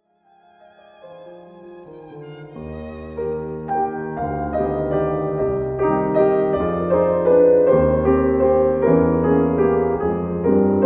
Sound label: Piano Trio in C Minor; Nikolaj Rimski-Korsakov